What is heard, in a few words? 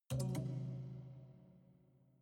haunted strings chord diminished damped